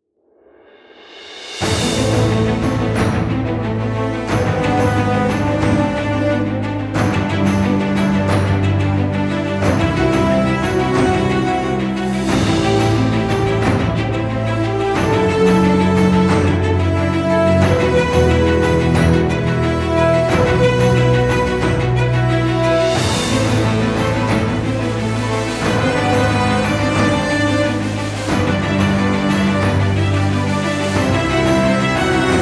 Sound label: Dramatic movement Background Music